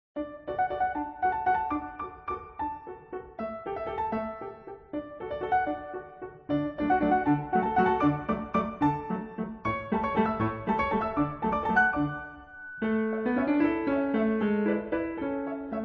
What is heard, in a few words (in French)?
Valse Tyrolienne; Villageoise I